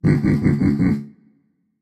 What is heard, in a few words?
hehheh; cerberus